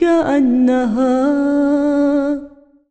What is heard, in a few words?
SINGER, ArabianTraditions